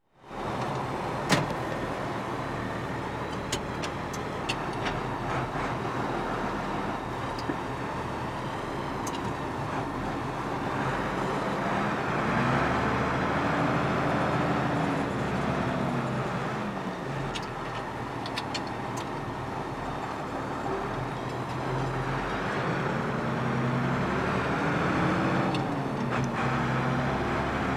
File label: Tractor Ford 7810 Tractor In Y; Farm Machinery; BBC Sound Effects Library